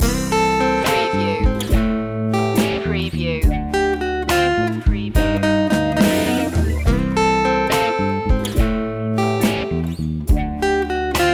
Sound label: cool shower loop